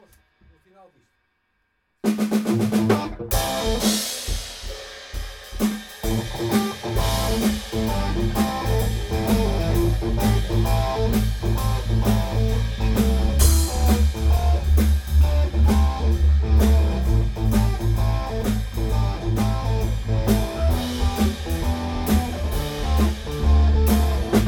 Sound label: Blues